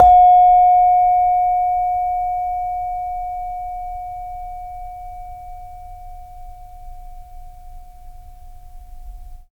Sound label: SARON F 2; Spectrasonics; Heart of Asia